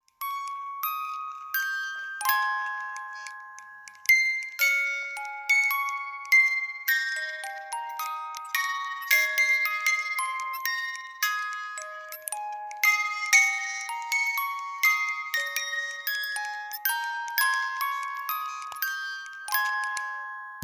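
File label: Boites à musique Manivelles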